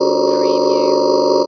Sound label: Space Servo Motor